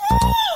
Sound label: wooow; michael jackson